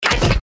bright body hit

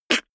beatbox snare harsh damped

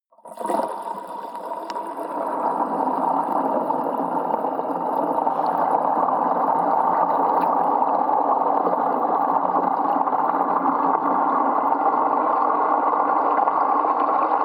sink underwater drain

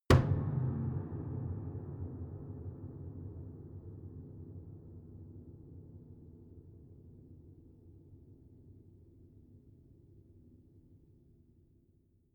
piano body hit

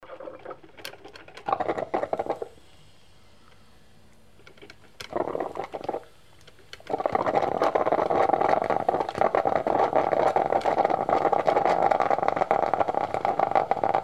coffee machine

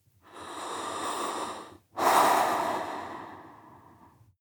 breath female normal sequence

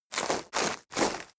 herb

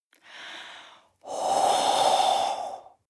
breath female eerie

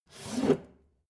take book hard

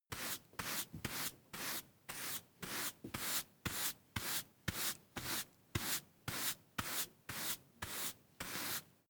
broom hand sweep sequence long

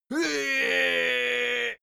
goblin die

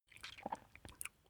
swallow food

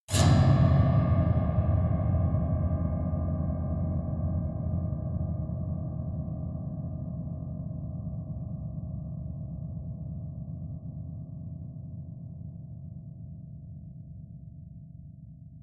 piano twang multiple fast dull

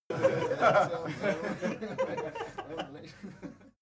laugh male

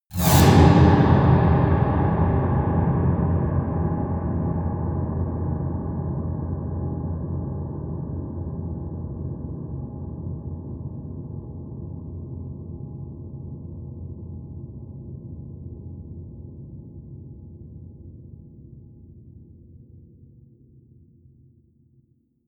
piano twang multiple fast low

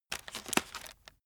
chocolates take hard

